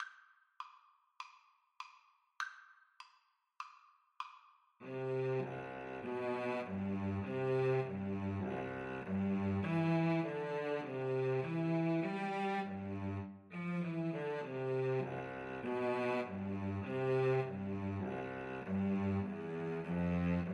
Cello Duet

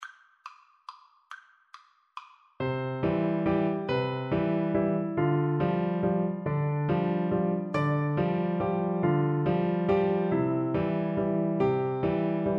flower waltz, Flute

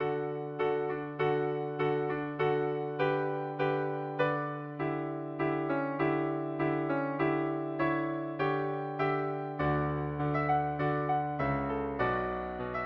piano

